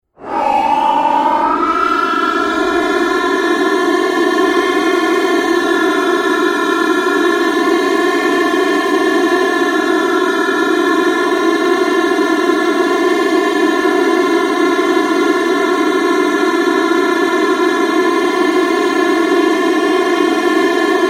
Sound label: SURGE, Various sweeps, pulses and drones; Rise And Dive; Rises and Dives; Sound Design